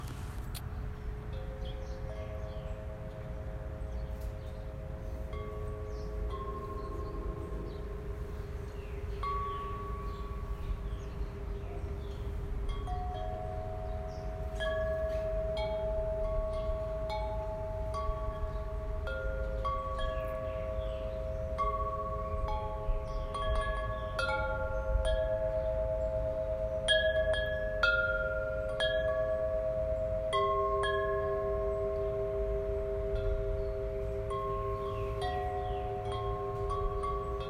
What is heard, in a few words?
Chime at morning; Guilherme Rubesam